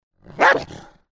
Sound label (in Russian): harakternyj zvuk laja s hriplym pridyhaniem